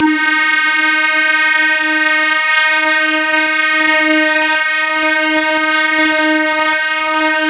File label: D-4 Synth